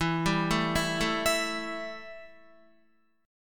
E Minor Double Flat 5th E, Guitar; arpeggiated; JGuitar

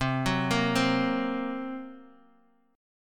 Gb Major Flat 5th, C

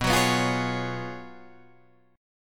Gb Major 7th Suspended 2nd Suspended 4th

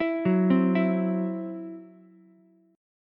C Major Flat 5th, Ukulele; arpeggiated

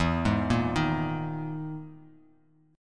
E Major 7th Flat 5th down Bass Standard; EM7b5 arpeggiated; JGuitar

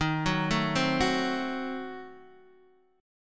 D sharp Diminished 7th D sharp Guitar Open D

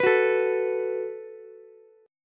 Gb Minor Double Flat 5th, Ukulele; strummed; 16,18,17,x

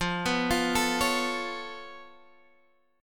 C sharp Augmented 7th, F

